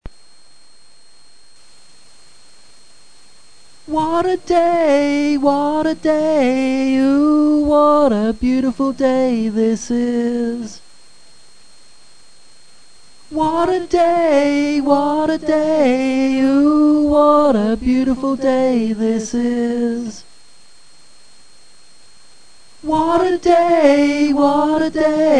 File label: Little Bird, Combined Vocal Passes